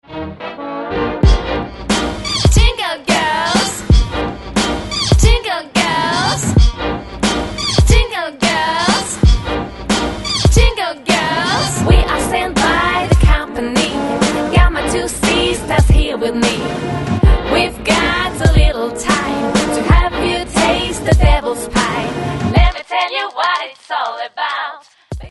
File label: Jingle Girls